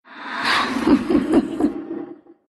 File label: laugh, ru; dota2